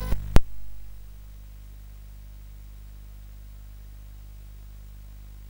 CSG8580R5, GAMES; Witch Switch

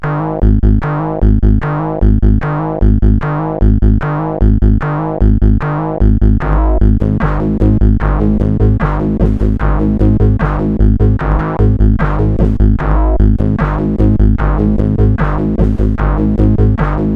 Small Riff, sid CSG8580R5

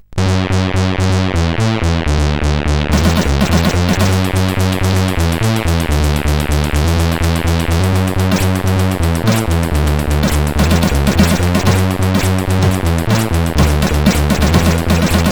tune, CSG8580R5

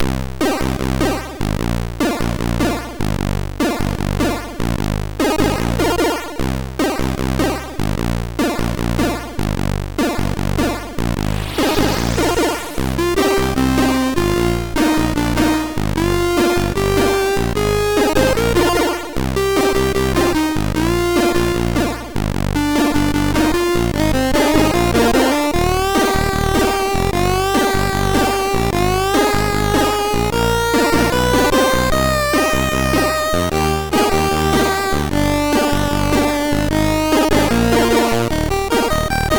Jazz, MOS6581R3; Genetic; hvsc

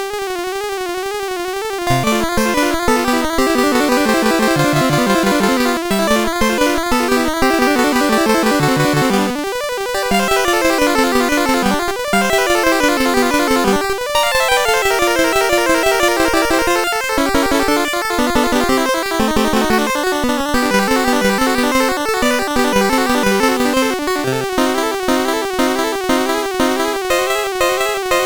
Spinning Song, MOS6581R4

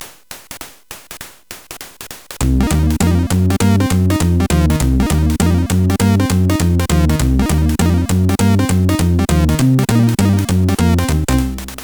Jazz Miniature, CSG8580R5; hvsc; Froyd

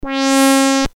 sid CSG8580R5, GAMES; Draughts

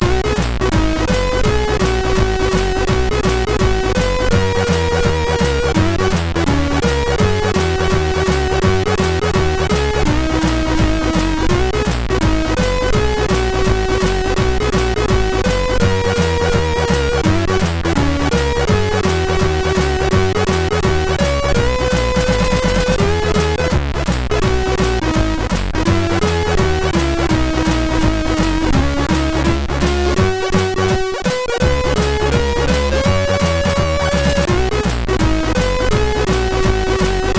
Jazz, MOS6581R3

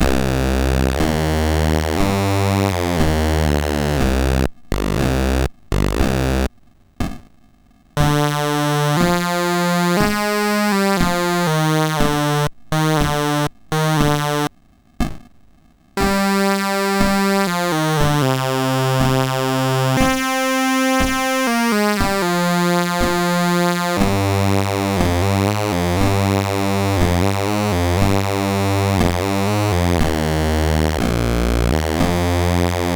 sid MOS6581R2; Schliesser Joerg

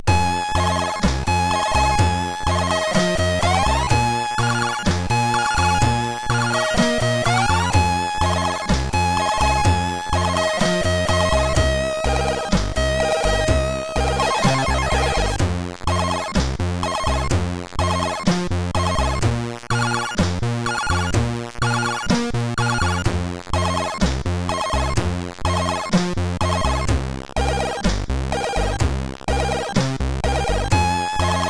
Sample, MOS6581R3; Sample V1

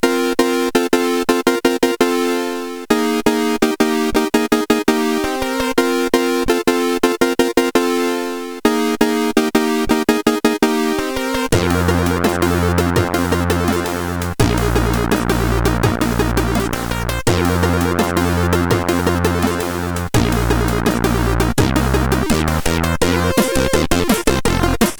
Treble, sid CSG8580R5; hvsc

